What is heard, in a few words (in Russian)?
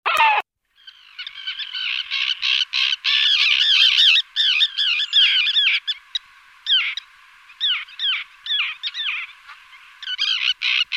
rechnaya krachka sterna hirundo